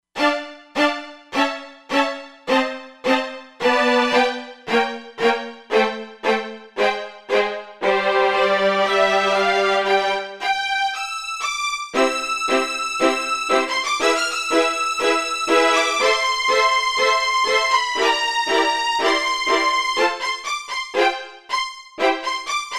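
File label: Arco Violins; Factory Presets; E-mu Proteus-2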